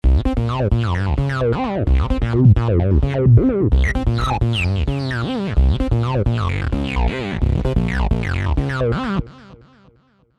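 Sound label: TB Saw distortion bass, V-Synth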